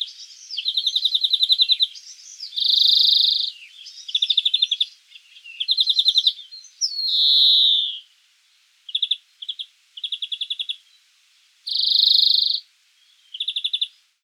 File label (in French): verdier d europe